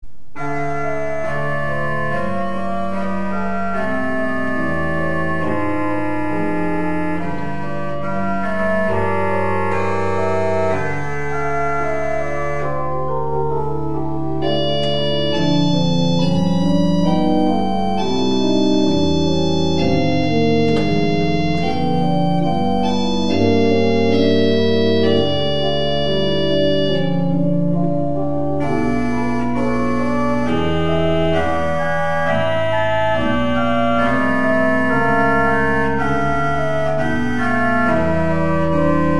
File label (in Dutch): orgel; M. Dupre: Variations sur un Noel